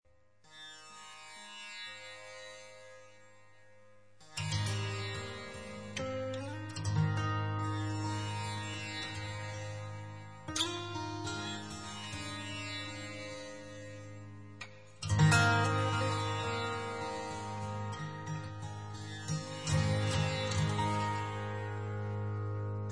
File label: Raga Yaman